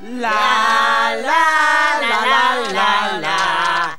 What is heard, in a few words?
lalala